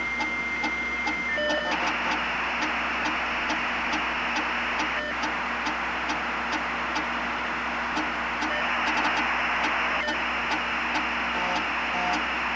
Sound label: computer dial up